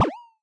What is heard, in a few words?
hero sneakers jump; subway